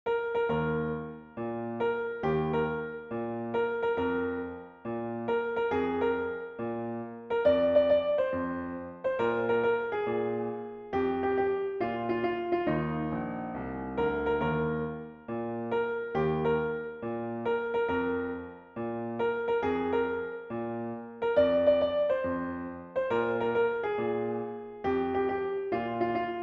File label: （ピアノ）